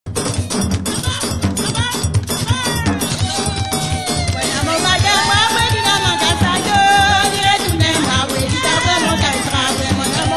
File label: Séga